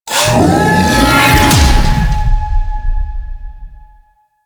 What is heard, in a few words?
Grineer Wolf Hammer Slam